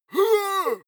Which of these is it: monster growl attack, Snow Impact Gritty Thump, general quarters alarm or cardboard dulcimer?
monster growl attack